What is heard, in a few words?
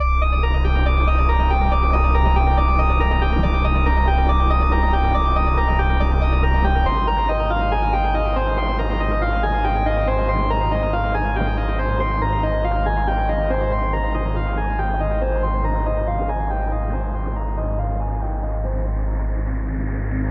Submerged in Ambiance ReMix